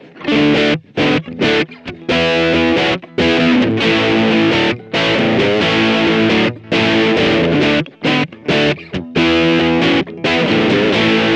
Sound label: Vox AmPlug Green Mode Full